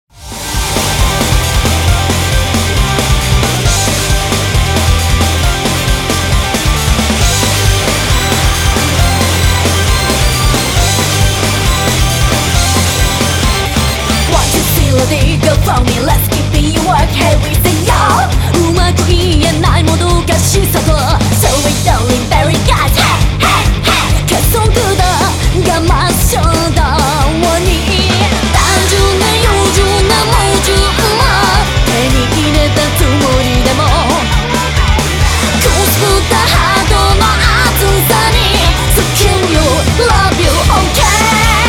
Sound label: ringtone